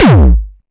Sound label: Kick